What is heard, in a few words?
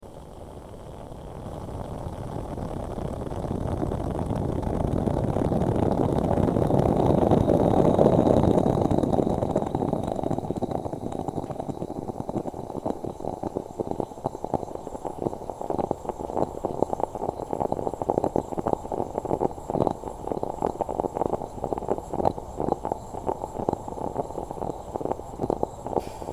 Wind And End Sounds